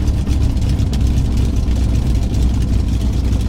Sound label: mustang cockpit, engines; War Thunder